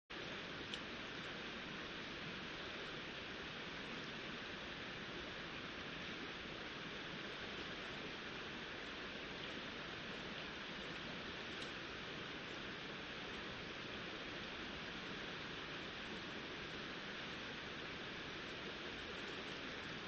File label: riverside; Kyoto U; Ashiu